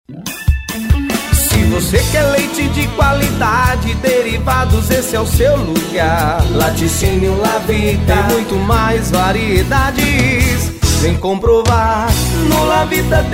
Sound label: JINGLE; LATICINIO LAVITA